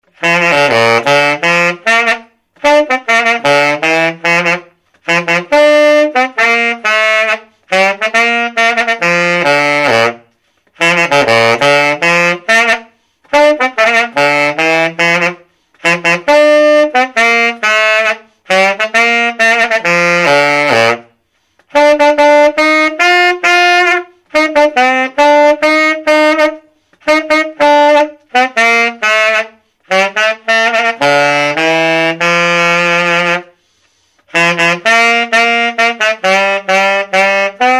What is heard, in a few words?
Martin C Typewriter, Sax